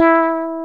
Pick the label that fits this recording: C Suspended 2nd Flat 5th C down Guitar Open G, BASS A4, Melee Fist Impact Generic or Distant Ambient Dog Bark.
BASS A4